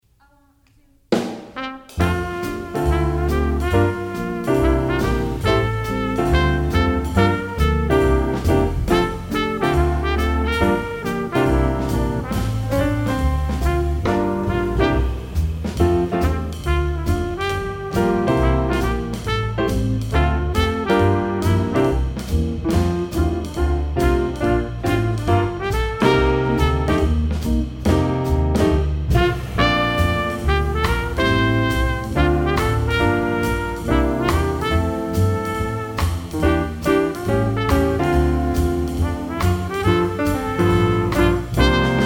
Piano; all state jazz etude recordings

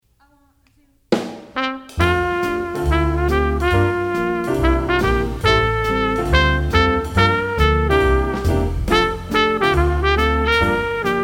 Trumpet; all state jazz etude recordings

